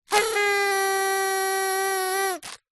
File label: party horn blow paper tube roll out; zapsplat; foley